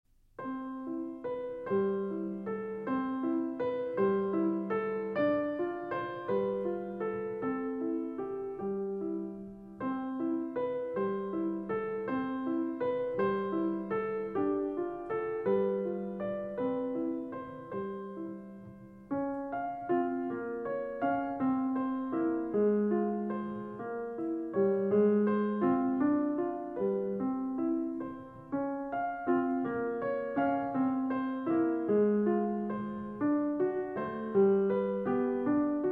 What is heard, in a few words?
the poor barrel organ player